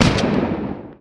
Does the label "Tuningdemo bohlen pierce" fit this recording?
No